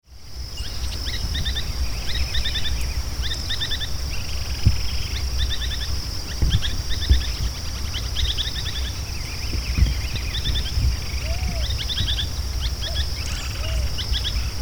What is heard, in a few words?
Synallaxis spixi João teneném